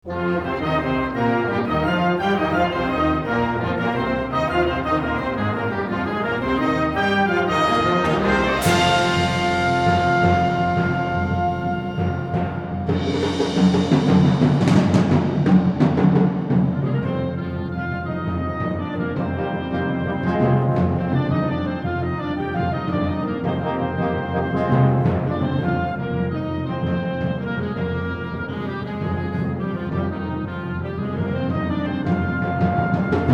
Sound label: Fantasy for Wind Ensemble